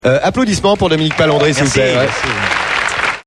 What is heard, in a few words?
Aplause